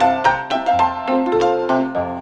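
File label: ZIMBAL MELODY; Best Service; World Colours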